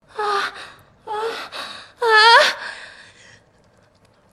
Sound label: argh